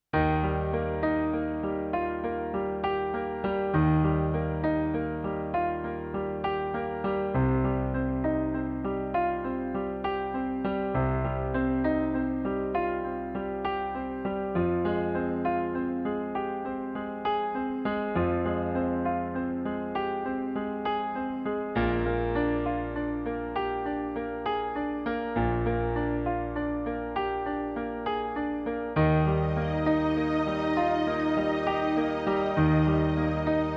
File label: piano